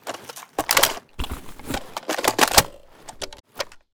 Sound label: rpk16 reload, weapons